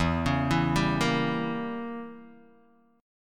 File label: E Diminished 7th E down Guitar Open D